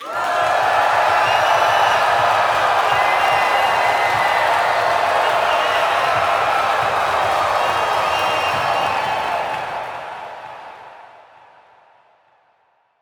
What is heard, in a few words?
Game Start Cheer, Crowd; Lunaro